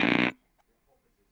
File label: Tom Lo Speaker, Stylophone Beatbox